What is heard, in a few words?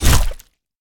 melee impact; smite; god odin